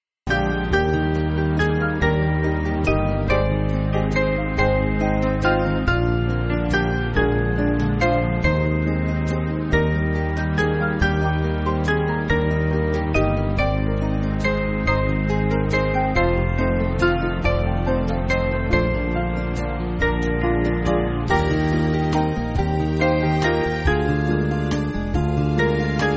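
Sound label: Band, Music Backing Files for Churches; Like Wandering Sheep; McGranahan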